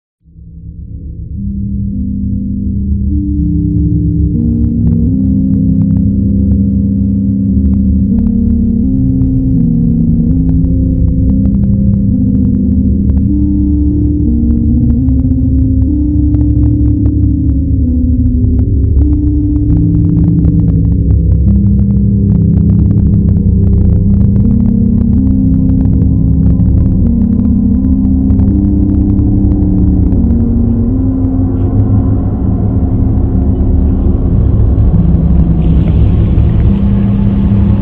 clock

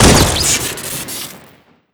rail smg fire crit

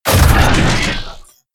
bolt wind

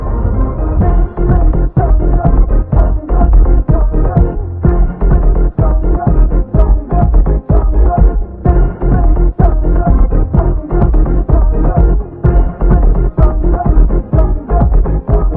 funky

